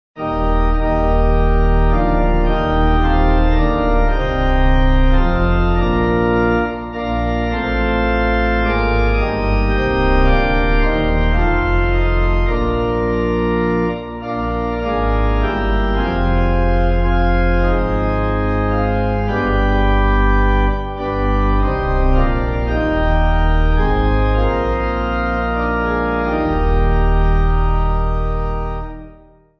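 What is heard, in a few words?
Pipe, Pipe Organ backing; Father Of Heaven; Angelus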